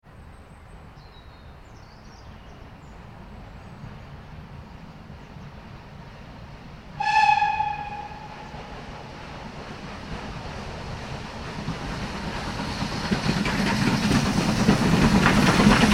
transportation train locomotive carriages pass by british rail standard class, Steam Train/Locomotive Pass By; Locomotive Steam Train Pass By - British Rail Standard Class Tank Engine Pulling Several C; Trains; Vehicles